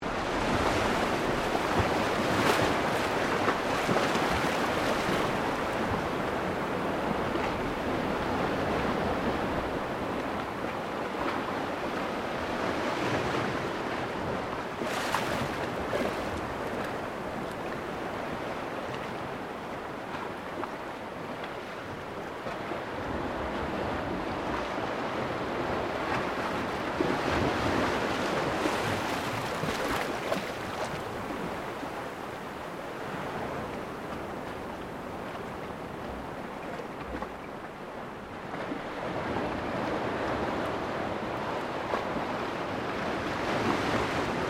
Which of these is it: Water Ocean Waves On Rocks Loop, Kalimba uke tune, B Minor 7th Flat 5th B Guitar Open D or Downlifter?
Water Ocean Waves On Rocks Loop